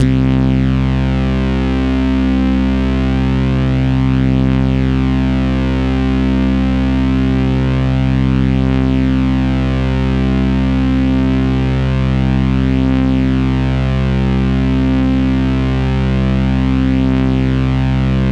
C2, Synth Line; 90 s Sample CDs; NiceBeats; SynthLine VOL-14

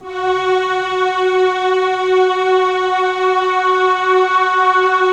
Thick Analog Pad, F#; E-MU Producer Series Vol. 5 – 3-D Audio Collection; 3DSynths; 3DThickAnalogPad

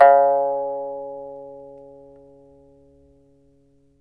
PIPA C#4, CHINESE SET; Spectrasonics; Heart of Asia

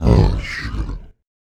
VOICE FX, Dance Vocals

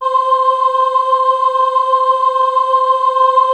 CHOIR; Greytsounds; Scott Peers Sound Engineering

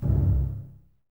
BSS C 1 Pizz, String Ensembles Basses; Miroslav Vitous

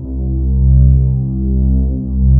ANA STRING, pads; Pro-Rec - Synthsonic

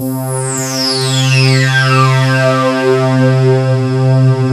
CLASSIC SWEEP, ROLAND JD-800

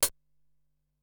Closed Hi-Hat, Drum Machines Ensoniq SQ-R-Plus

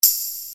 Hat, EuroDance

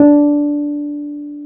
PNO T.Wurly D 5; Keyboards of The 60's and 70's

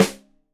Rim Shot Stereo, drums

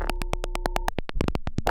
Cwejman S1; FtFtF Message; 300 Drum Machines; DevSnd

